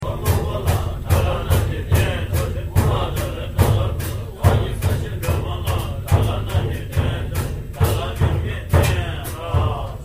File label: Drepung Monks and Horns